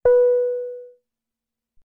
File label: announce; raspberrypi speaker